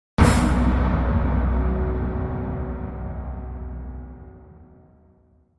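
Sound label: Impact Metallic Clang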